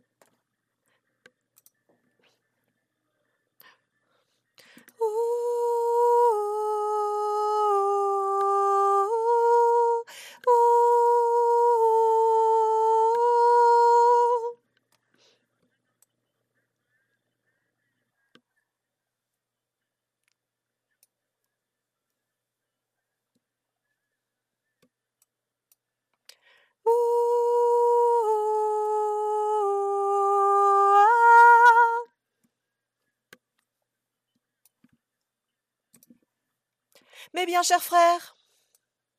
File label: soprano2